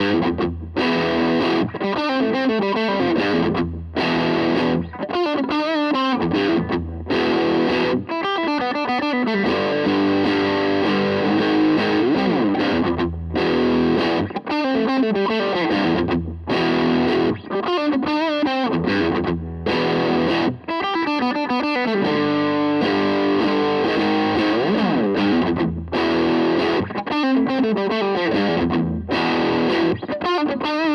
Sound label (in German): Harley Benton Vergleich HB Zerre; Maybach